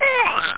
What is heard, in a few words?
splat; Oh No! More Lemmings